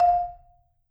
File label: MMED F F4, Marimba; MRMBA SPLIT